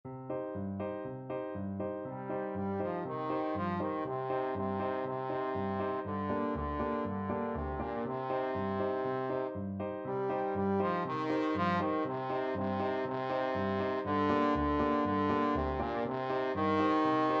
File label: trombone